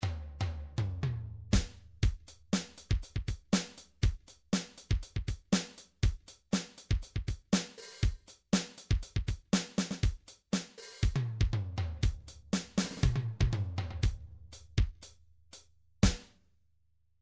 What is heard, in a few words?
midi drums drums funk